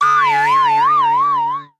Stars Sound; Jolly Battle

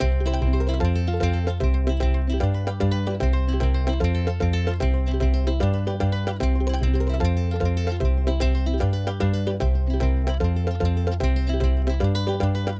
musicloop; SpongeBob Patty Pursuit

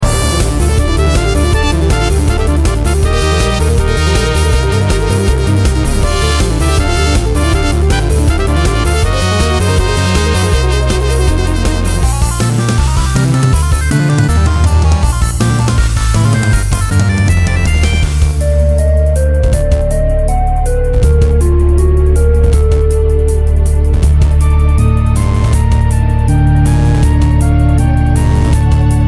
Hyper Battle

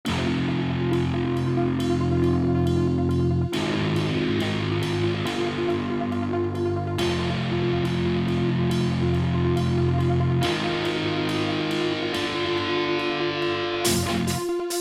Instrumental

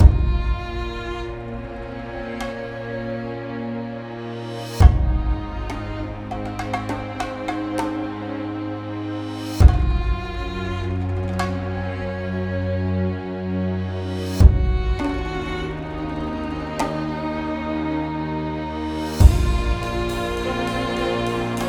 epic inspirational trailer; Tanzia; Switch Windows gamerip 2017

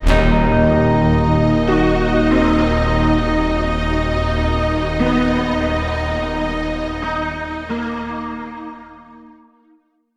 Draw

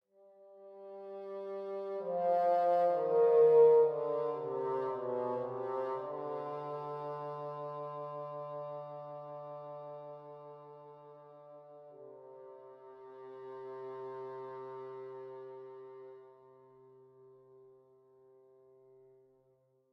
Stinger Gameover Negative Stems Bassoon

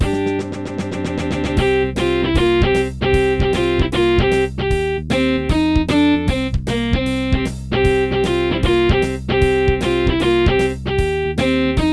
BGM Title Fast; Yuru Yuru Gekijou Gekijouban 2 3

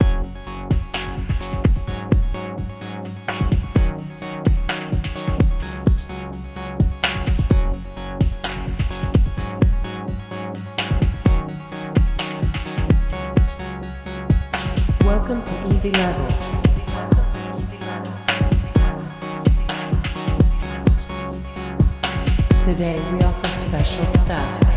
minimal intro; Avoid Sensory Overload

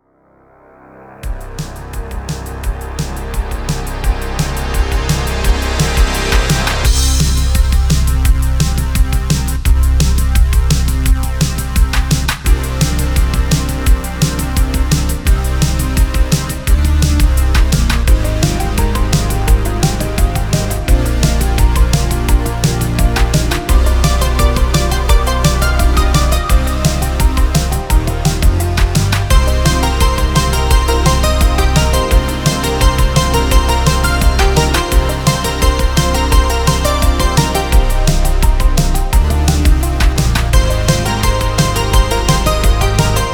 Rhythm, 171 BPM, All Stems Assembled; Yum Yum Cookstar

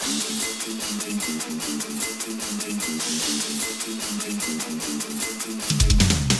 boss Start

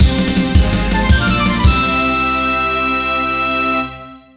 Jingle Good; Azito 3D; 3DS

